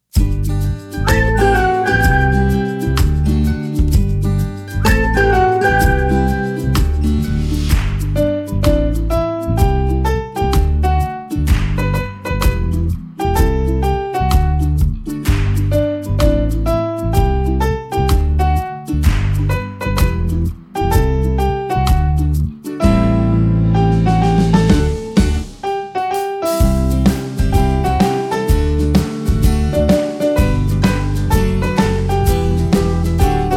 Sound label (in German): gemeinsam lachen macht uns spass pb mel